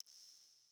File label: water drip light; Rust